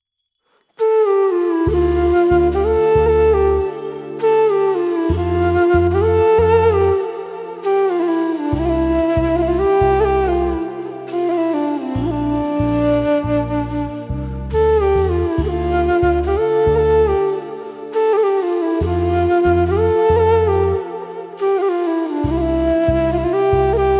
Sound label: Flute Version